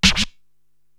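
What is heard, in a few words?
DJ SD Rub, snare drum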